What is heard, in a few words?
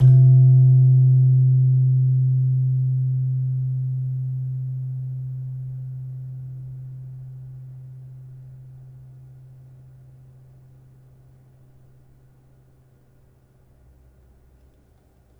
Slentem hard, gamelan; gravityharps; timbrebox